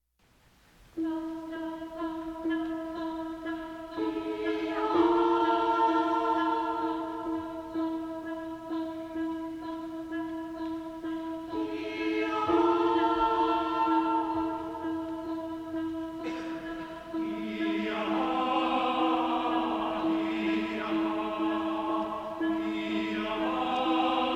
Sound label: Six Canadian Folk Songs; Six Canadian Folk Songs Set 2, op. 86; Elmer Iseler Singers, conductor Lydia Adams; CBC Radio Broadcast taping; Derek Healey